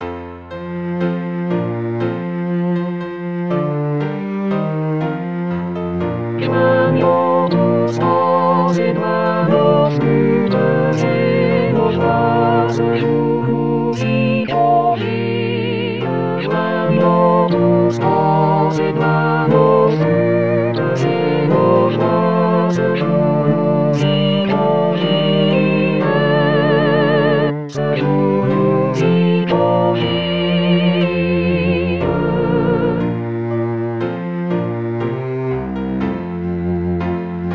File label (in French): joignons flutes sib tutti; 2022 st jean